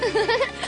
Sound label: laugh